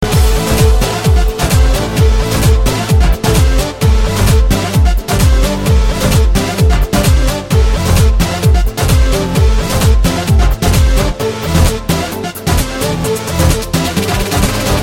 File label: Rhythmic Dj Mix